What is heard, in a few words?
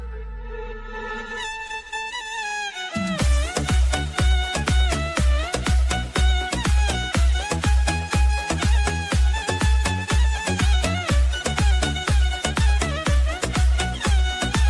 arabic remix